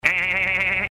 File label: hehehehehe